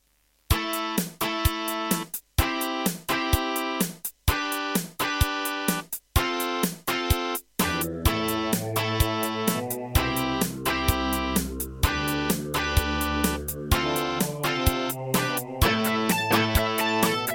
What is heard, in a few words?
Aztech Sound Galaxy Wave Power; raptor call of the shadows